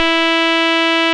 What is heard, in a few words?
rave beep E3; Best Service Alpha Dance vol.2